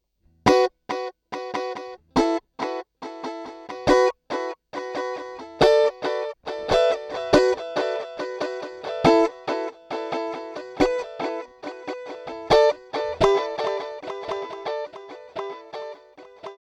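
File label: Guitar Split; ReTracer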